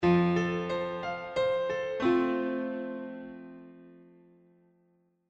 Tonartsgivare F

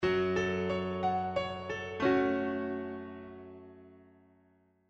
Tonartsgivare Fm Gbm

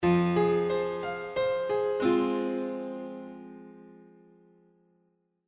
Tonartsgivare Fm

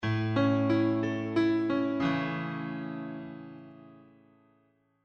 Tonartsgivare A

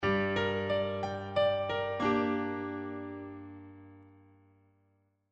Tonartsgivare Gm

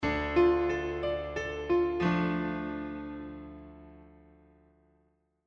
Tonartsgivare Dm

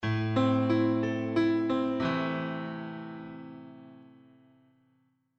Tonartsgivare Am

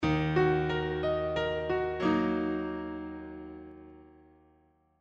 Tonartsgivare Dm Ebm

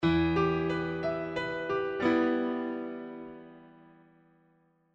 Tonartsgivare Em

